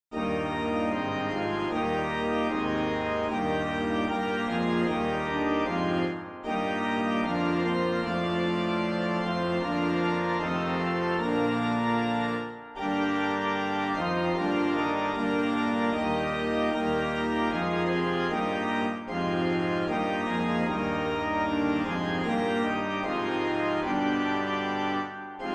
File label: Organ